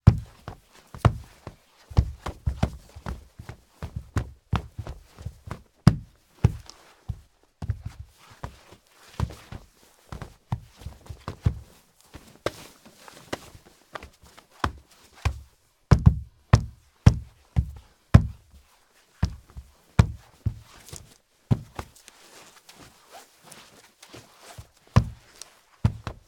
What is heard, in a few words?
socks linoleum foot scrape stomp struggle fighting being put in a reversed choke hold